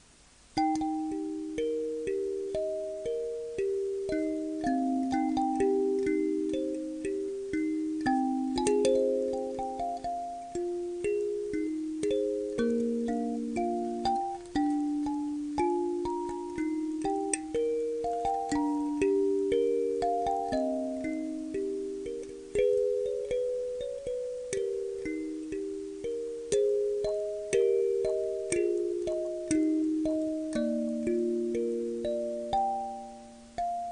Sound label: relaxing kalimba cover